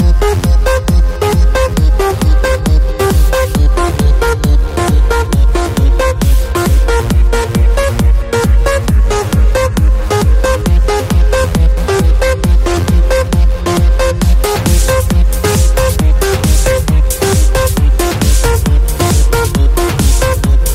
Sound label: best electronic ringtone